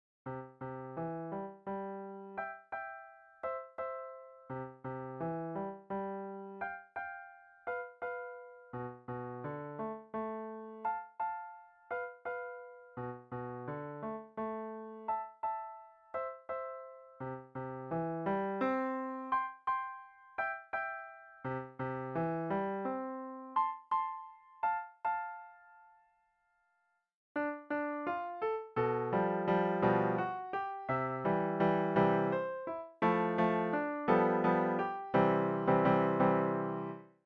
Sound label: piano; The Blue Danube; classical